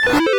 spawn

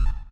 drop; Techmino

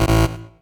error

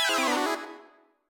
spawn

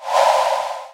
swipe, chiptune; Techmino

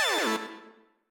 spawn; Techmino

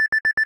warn beep, chiptune; Techmino

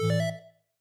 connect, chiptune; Techmino

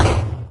clear, chiptune; Techmino

